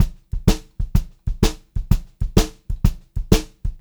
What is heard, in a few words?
126 CL VARI L, Pop-Rock Drumloops; USB Soundscan vol.37; AKAI; 90 s Sample CDs